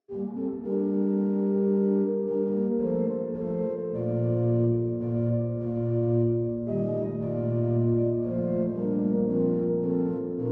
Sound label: Galliarde, Organ Recordings